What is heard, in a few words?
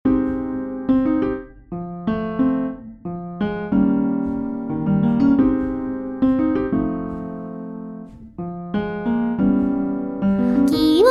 UNA CORDA pure